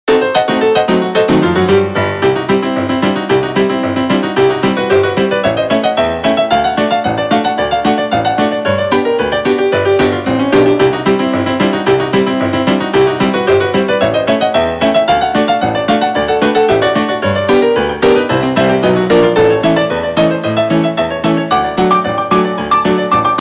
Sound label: Piano